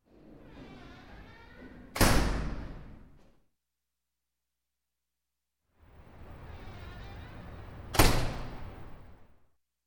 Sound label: Door, Metal Indoor, Automatic Closer, Squeak; Sound Ideas 2000 Series